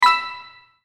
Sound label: puzzle success; LoveLegend